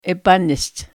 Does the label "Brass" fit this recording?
No